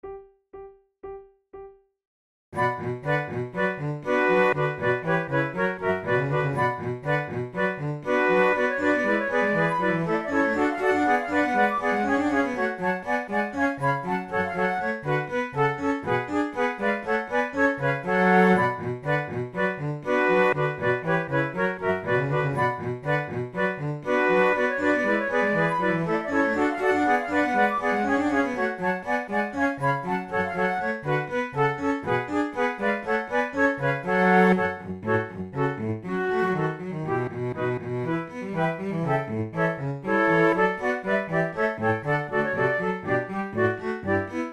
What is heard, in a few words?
badinerie quartet, flute